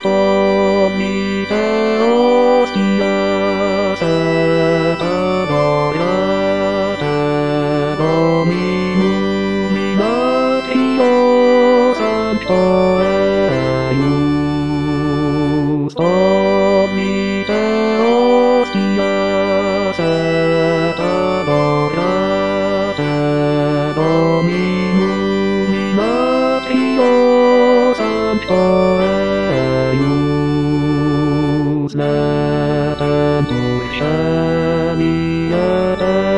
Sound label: tollite hostias basse